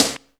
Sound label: DX MIX SD; REMIX 3